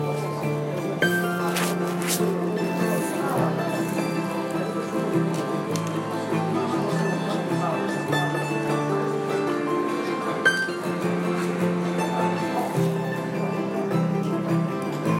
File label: Strings